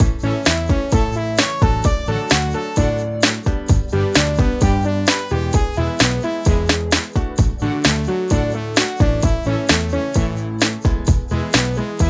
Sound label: Loop Machine instrumental